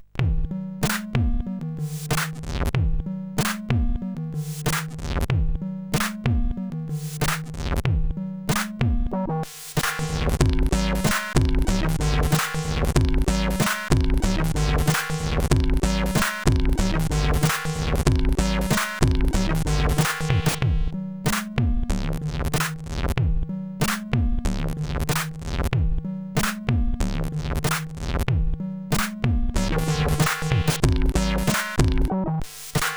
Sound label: sid, CSG8580; hvsc